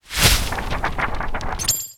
book

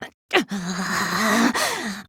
groan; ibuki

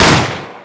loud bang

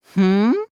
"hmm"; hifumi

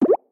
drip

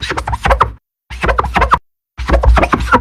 wiping

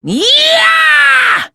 yee-ahh!; monokuma

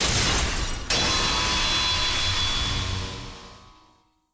cross swords; sans

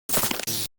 glitchy

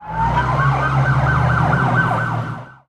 sirens

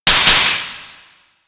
double slap; ryuunosuke

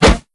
comb out; tgaa2

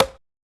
bonk

